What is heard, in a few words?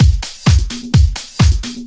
Latin Loop 128 BPM